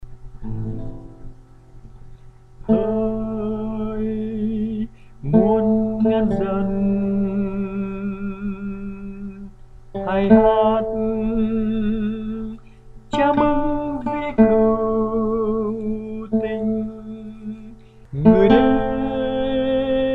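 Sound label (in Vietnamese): Chúa Ra Đời, Tenor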